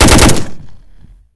m16 burst, AK47

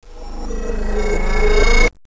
scary

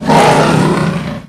snake die; dn3d r; enforcer

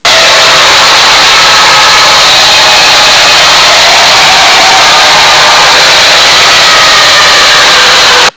screamer

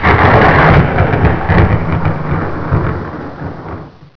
eerie growl thunder

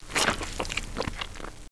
spore launcher reload start

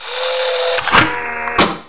reload, weapons

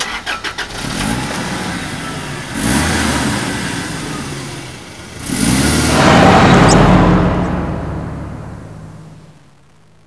car start

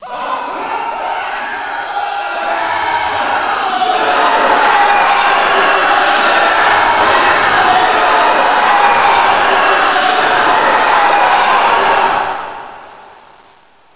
ultra scream

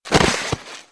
body drop normal; Physics

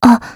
m4a1 start

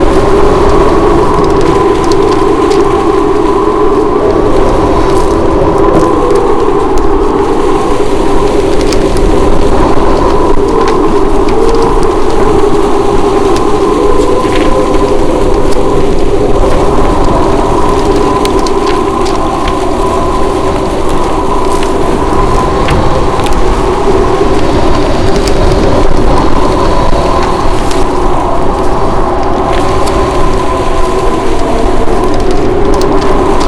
wind

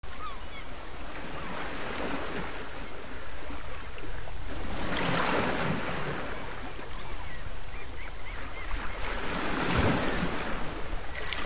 seagulls

